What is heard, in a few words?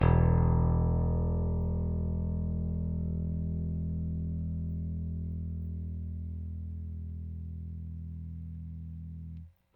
Rickenbacker Bass; Airwindows